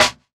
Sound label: SNARE; E-Lab; X Static Goldmine 2